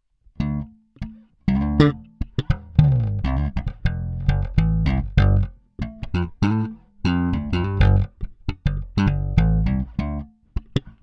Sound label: Humbucker Slap Tone UP; peavey shootout audio samples